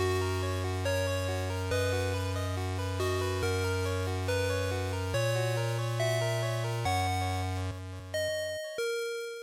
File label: GB RPG Title Intro